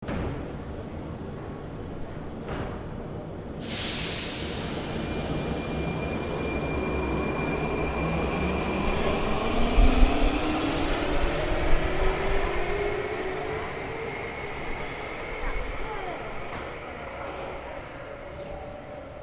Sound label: Metro Leave Station, field; Prag